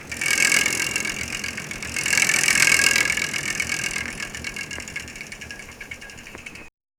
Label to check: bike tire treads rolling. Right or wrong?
Right